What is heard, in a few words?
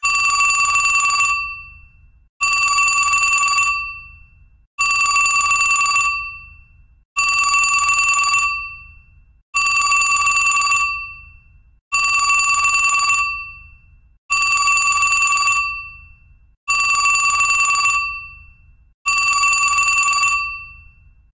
Vintage Telephone, ringtones; Escape P870; LG